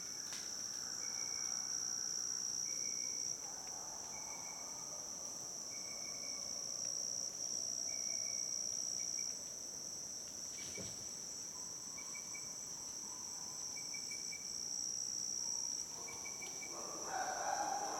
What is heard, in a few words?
Chimpanzees Gabon; wilderness songs